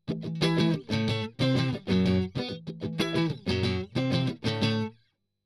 Strum Octav

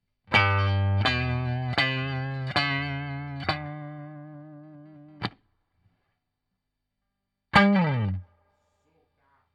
Guit Solo, RNR for piano

